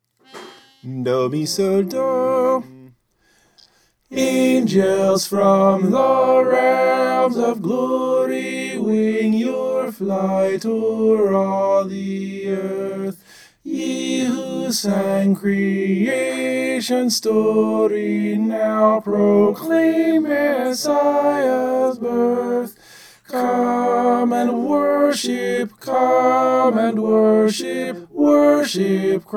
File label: tenor